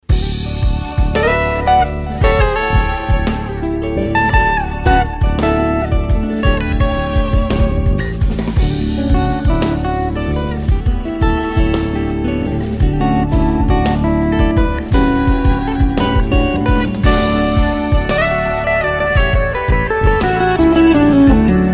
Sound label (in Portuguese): guitarra improviso